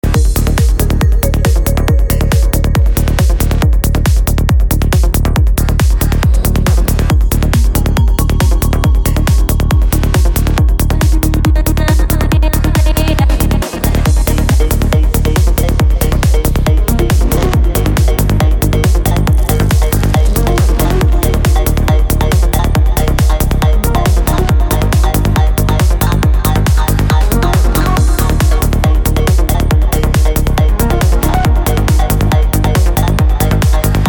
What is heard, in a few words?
metronome remix